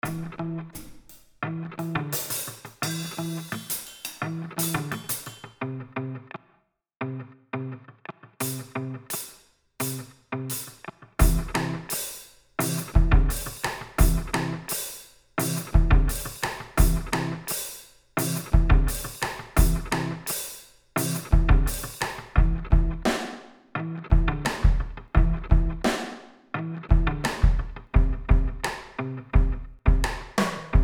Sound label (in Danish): spade og trommer